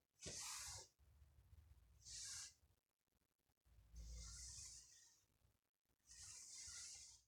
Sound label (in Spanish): Frotar Madera